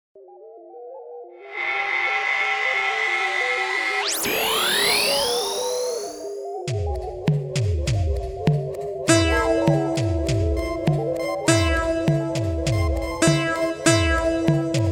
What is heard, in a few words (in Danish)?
Filmmelodi Sci-Fi